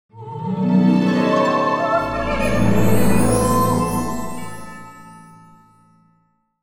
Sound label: puzzle solve; The Da Vinci Code; XBOX